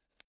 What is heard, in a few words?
zippo put on cover slow